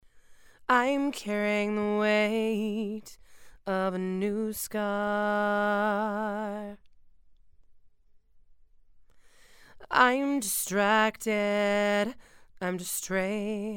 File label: Female Vocals